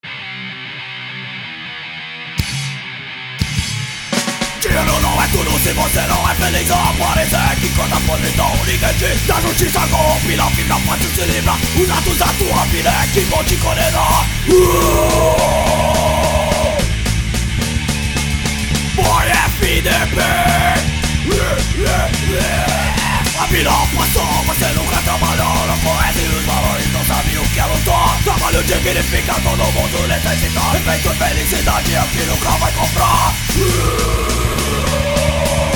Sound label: DISTORTION